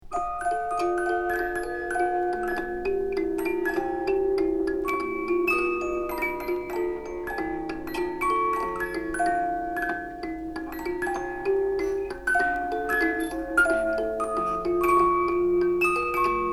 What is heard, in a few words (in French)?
boite a musique